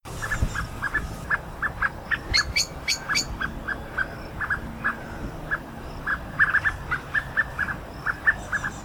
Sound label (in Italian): uccelli con meno rumore fondo